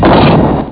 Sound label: ten ton; X-Mas Lemmings Demo